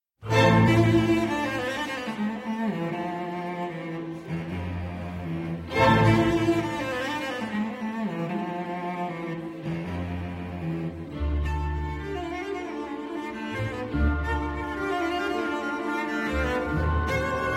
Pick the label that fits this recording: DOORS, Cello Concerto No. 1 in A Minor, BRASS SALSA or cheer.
Cello Concerto No. 1 in A Minor